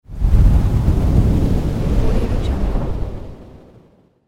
smoke whoosh; tibasound

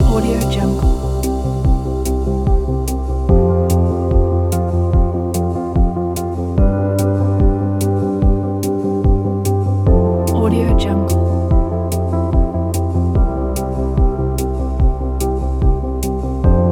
ambient simple loop

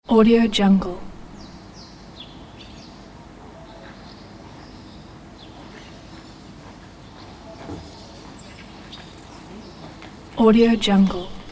morning ambience

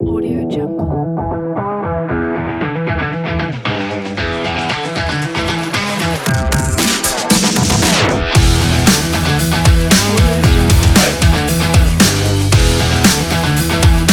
sports competition challenge

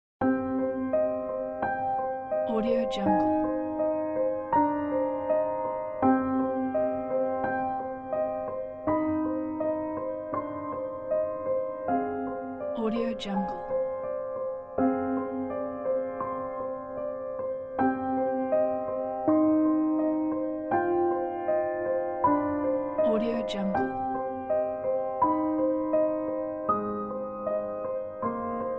dark piano background

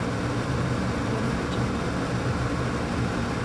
industrial ventilation system loop; stormwaveaudio

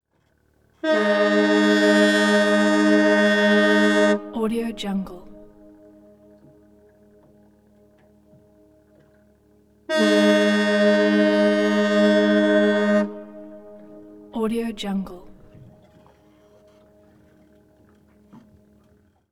alarming ship horn with echo

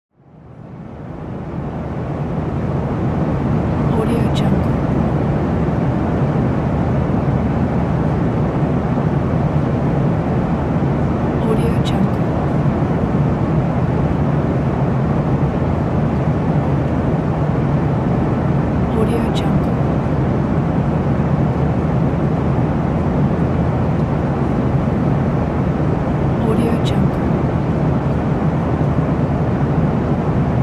plane cockpit; pure-noise

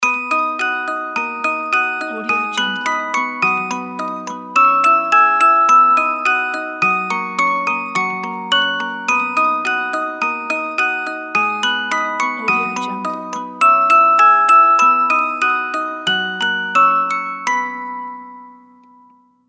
christmas jingle bells music box

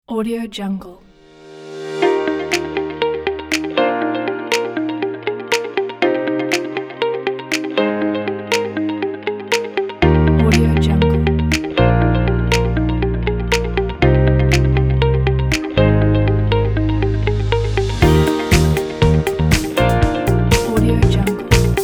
upbeat motivational advertising; dailywaves

